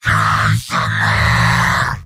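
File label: yell, robot; heavy; mvm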